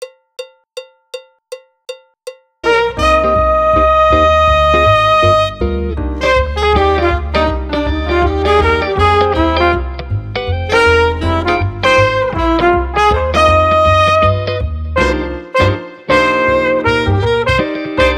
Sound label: Drum Track B, With pickup note